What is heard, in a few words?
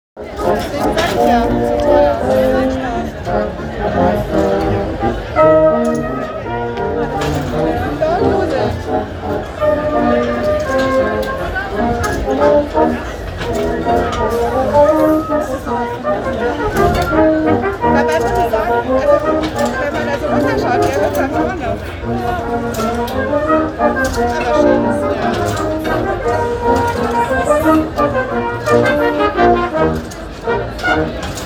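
live band merry go round, oktoberfest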